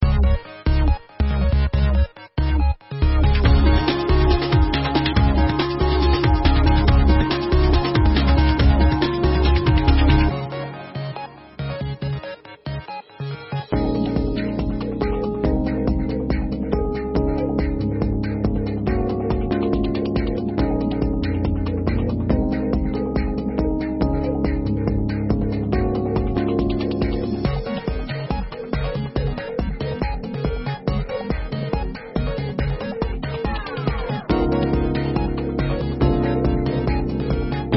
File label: bgm